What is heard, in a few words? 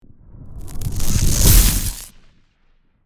Explosive electrical impact explosion shockwave